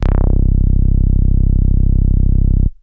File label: Bass Synths hits and octaves; FM 57 - The Ultimate Sample Collection (1997)